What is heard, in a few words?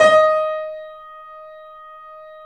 The Piano D#; InVision Interactive - The Piano